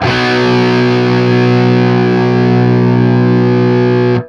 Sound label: 5TH B, Guitar; East West; Steve Stevens Guitar Samples Collection